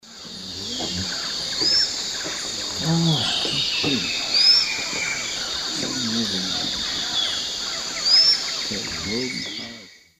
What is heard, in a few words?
Iodopleura isabellae, call; S. America; Eastern Lowlands